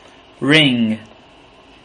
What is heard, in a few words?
ring